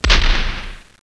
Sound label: CANON, Guns; PaxImp; PC Gamer 50